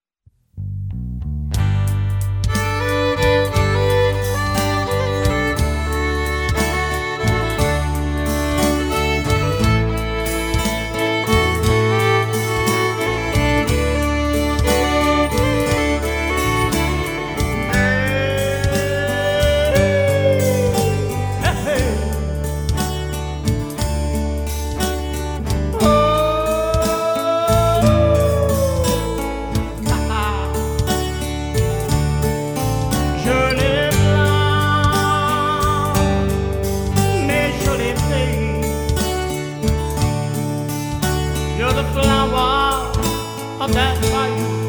Zydeco Band; Tampa

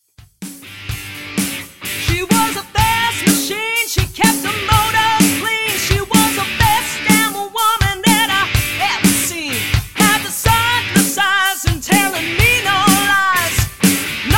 Cover Band; Seattle

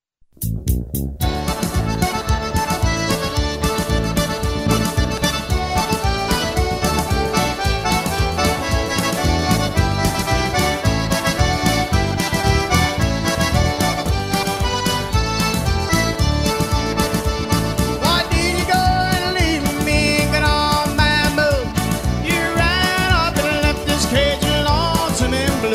Zydeco Band; Tampa

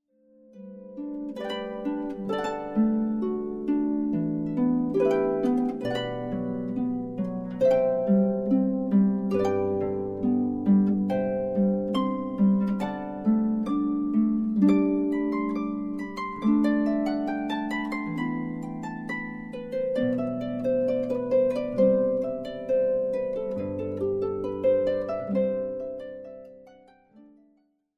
Harpist; Boston

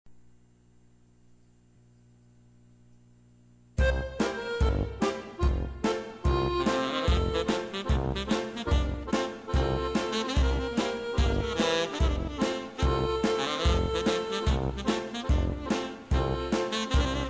German Band

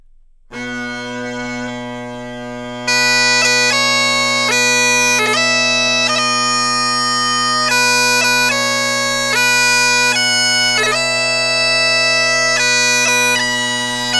Bagpiper, Happy Birthday; Los Angeles Bagpiper

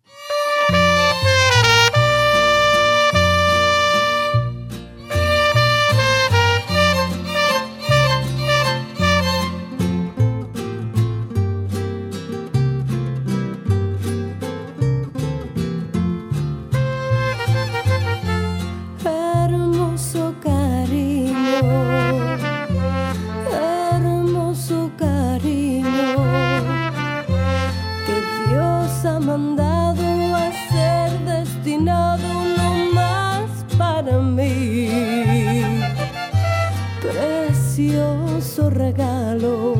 Mariachi; Spain Mariachi

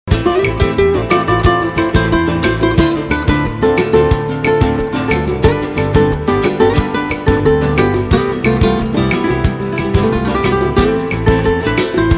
Tribute Band; UK

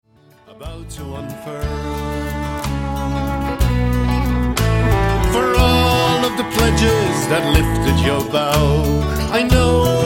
Celtic Band

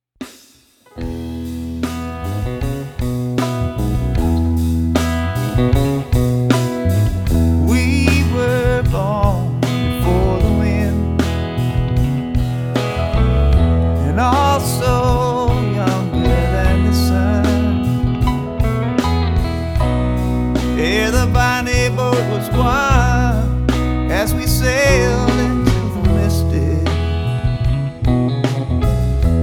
Variety Band; Chicago; This Chicago Variety Band is a real band, performing authentic

